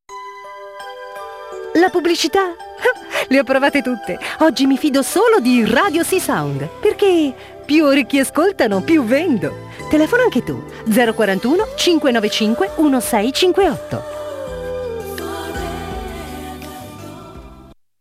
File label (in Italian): JINGLE LA PUBBLICITA'